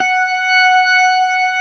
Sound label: STOPS, ORGAN; Keith Emerson Signature Series